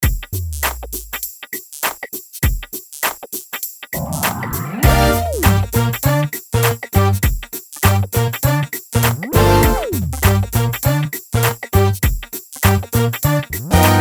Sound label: Gospel No Drums 100bpm